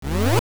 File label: Pause Menu Open; Open and close the Pause menu